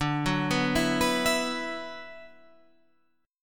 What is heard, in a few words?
D 6th Add 9th D, Guitar, Half Step Down